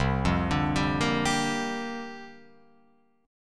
C 9th Suspended 4th, Guitar, Open F; arpeggiated; C9sus4

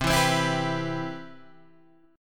C Major 7th, Guitar Standard; CM7 strummed; JGuitar

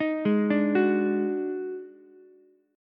Eb Minor Major 7th Double Flat 5th, Ukulele; JGuitar

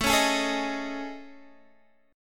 A Major 7th Flat 5th, Guitar, DADGAD

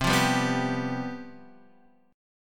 C Major 7th Suspended 4th, down, Standard; strummed

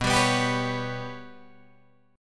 B Suspended 2nd Sharp 5th down Guitar Drop D

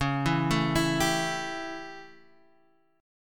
A 7th C sharp, chord

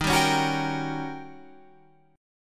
Eb 7th Sharp 9th, Eb, Guitar, Open F; strummed

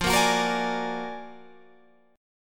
Gb Augmented Major 9th, Guitar

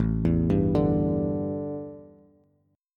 A 7th Suspended 4th, A, down, Bass; A7sus4 arpeggiated

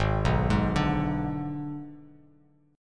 G sharp Diminished, Bass Standard; arpeggiated; 4,2,6,7

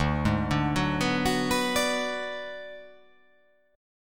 D 6th Flat 5th D down, chord

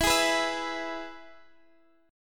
E-Major E, Guitar Open D

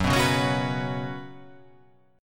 F sharp Suspended 4th

